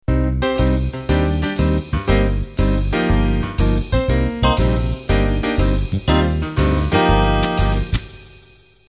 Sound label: Ballroom Swing Intro